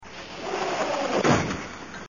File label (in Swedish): Swoosh Krasch